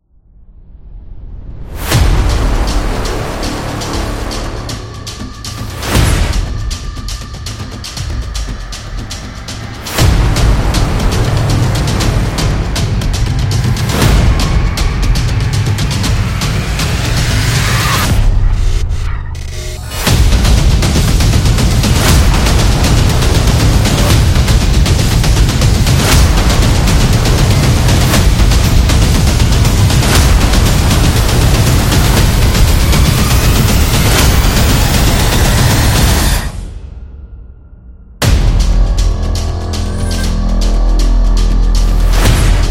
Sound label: Cinematic Drums Epic; monster attack